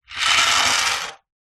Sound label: household jewelry wedding ring platinum slide table desk; zapsplat